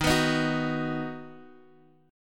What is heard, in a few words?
D sharp Minor Sharp 5th D sharp, Guitar Open D; 13,14,13,12,x,x; chordshape